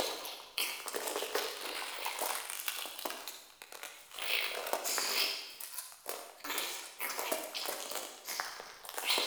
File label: Infested Eris Interior Critter Loop